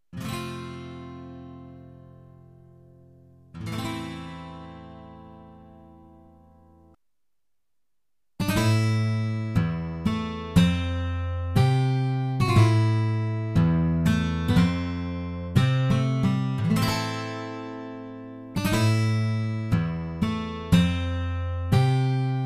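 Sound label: guitar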